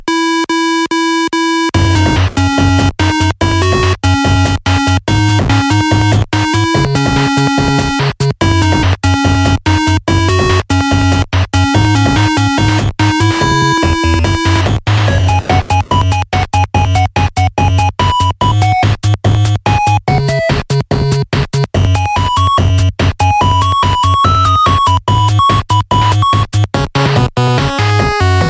8 bit; dmmd

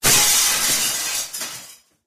Glass break; dolby

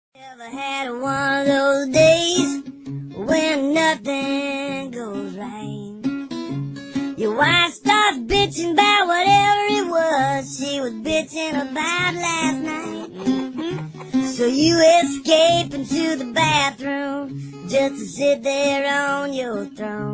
Blues

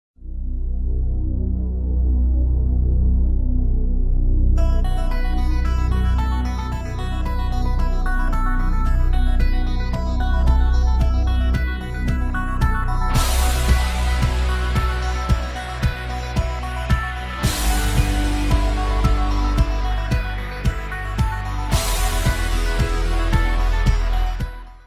wake up sound zen

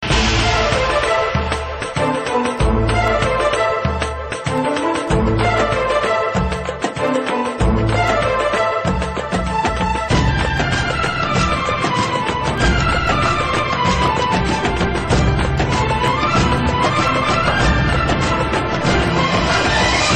Violin

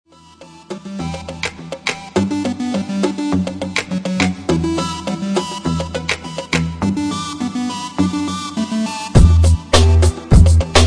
go go club instrumental

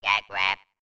Snapper

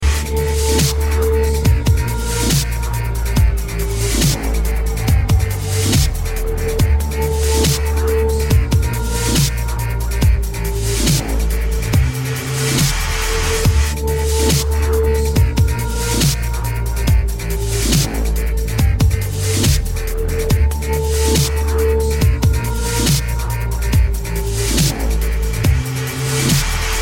Epic Dubstep

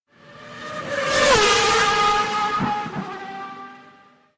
Formula 1 SMS

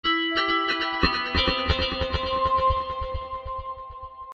delayed guitar, ringtone

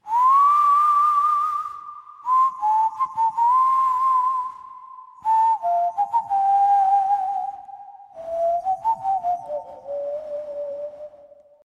Whistle Theme; Kabali

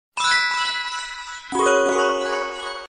sparkle msg tone

